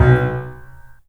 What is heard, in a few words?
PLUCKS, The Piano; InVision Interactive